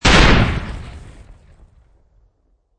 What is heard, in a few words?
Explosion Grenade Dirt Debris, Sharp explosion, medium distance with dirt debris; following the blast; Bombs; LynneMusic